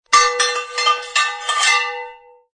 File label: Sword Short Clash, Two swords clash.; Combat